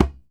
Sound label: DJEMBE, African Perc